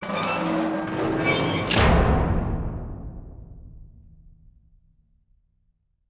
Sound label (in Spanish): Puerta calabozos